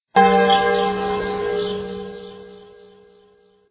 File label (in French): cloche